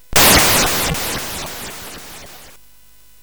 Frantic, CSG8580R5

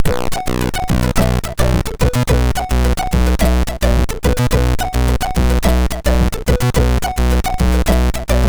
Breakdance, MOS6581R2

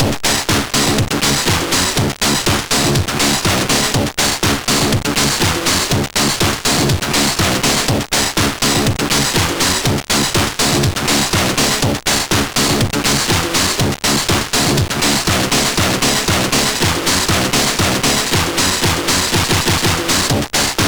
intro, MOS6581R4; Future Megademo

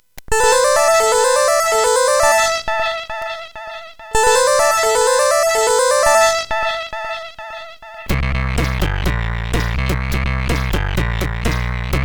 Heavybass, sid CSG8580R5

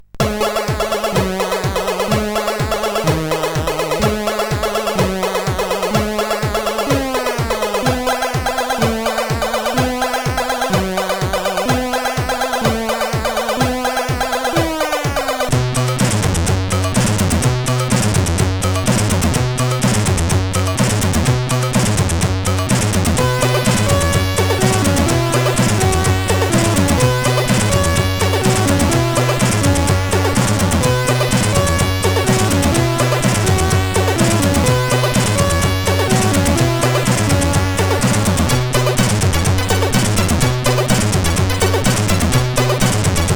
Action News tune, MOS 8580; CSG8580R5

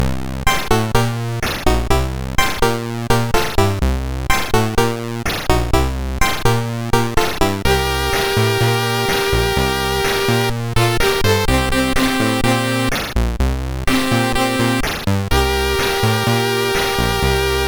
Synth Sample, MOS6581R3; Final Synth Sample II

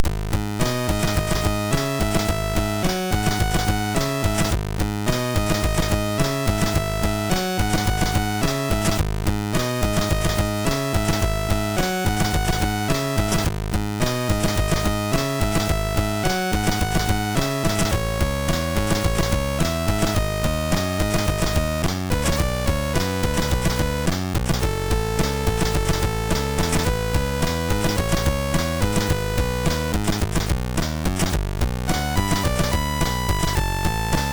Remix, MOS6581R2

